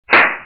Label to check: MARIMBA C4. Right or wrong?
Wrong